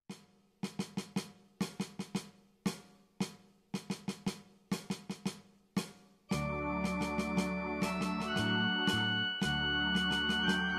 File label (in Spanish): Marcha Navidena